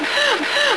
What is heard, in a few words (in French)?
aspira; voxrom; boryslaw